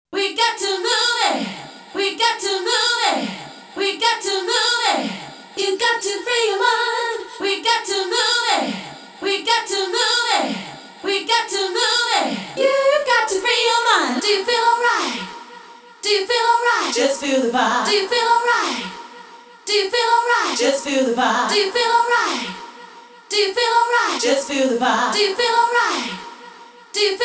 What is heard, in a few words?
Acapella